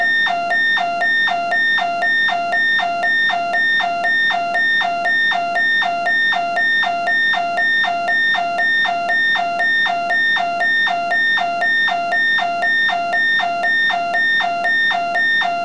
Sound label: 688 power plant alarm